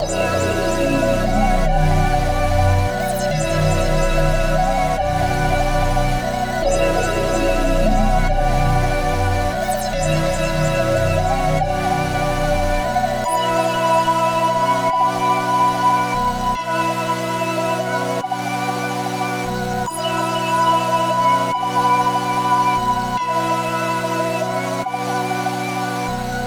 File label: 145 BPM B Min, Melodies; Renegade; Cymatics; Immortal Production Suite Beta